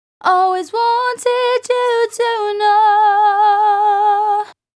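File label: Chorus Female Vocal Dry, Gb, 126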